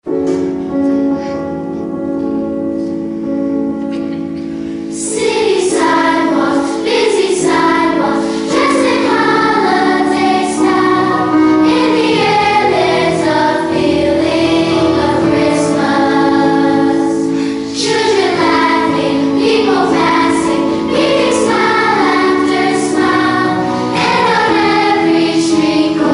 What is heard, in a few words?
Chorus, Akustik-Session; PS22; detektor fm